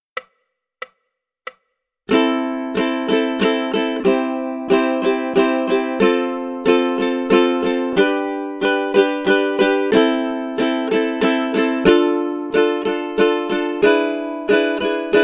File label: rhythm study, ukulele; grade1